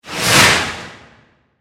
Space, Whoosh, Airy, Science Fiction, 5.1; Dark

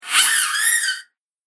Weird Noise Gremlin Spirit, ghost; horror

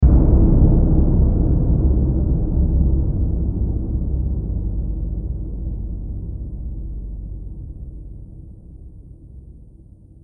Drum Hit Trailer; sound design

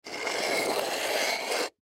Plastic Object Drop; Plastic Object Drag Slide Move On Cement; Foley; Blastwave

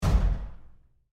Door, Bathroom Stall, Close, Roomy, Lite

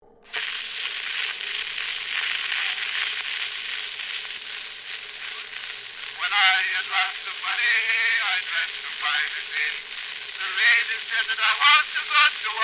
Phonograph Song, Wax, Cylinder; Technology; Miscellaneous Electronic Sound Effects; SoundEffects-BlastWave

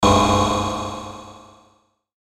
Hit Warble; Hit Warble Feedback Delay; Warbles; Blastwave

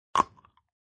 Crunchy cracking sound effect of bone breaking.; Injuries; Combat

